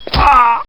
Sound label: pain; slender; shrek